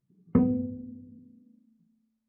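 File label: Bass pizzicato fortissimo sul D B2, Double Bass; MIS Pitches - 2014; Strings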